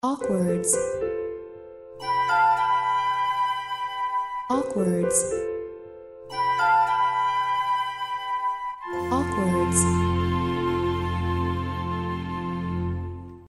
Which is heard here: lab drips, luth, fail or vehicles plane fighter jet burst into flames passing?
fail